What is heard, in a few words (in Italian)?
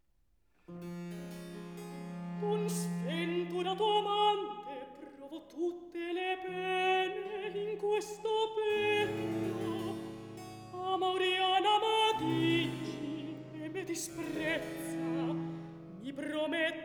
recitativo